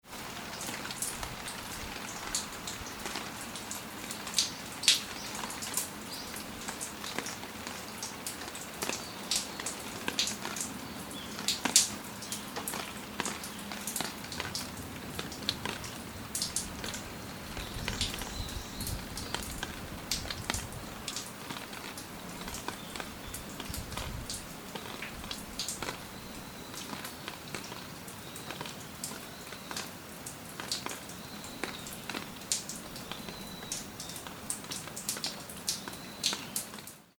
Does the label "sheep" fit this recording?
No